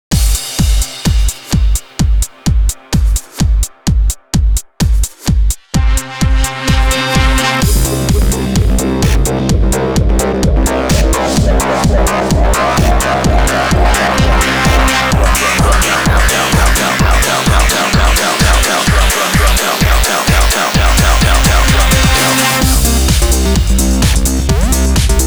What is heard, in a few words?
Drum Town; DJMax Portable 3